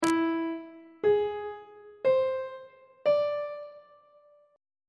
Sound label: e augmented 7th chord intervals; on treble clef